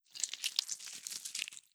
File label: Bolt Move; Futa Bolt Move4